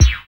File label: DRM OLD, Dance Synth; Sound & Vision - Gigapack I CD 2